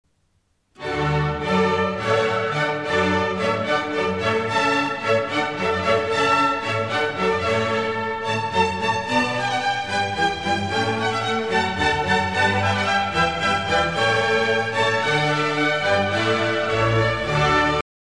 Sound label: Hornpipe, string quartet; Handel